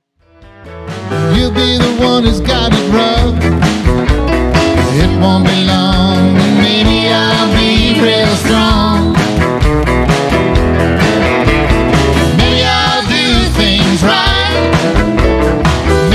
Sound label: Backup Vocal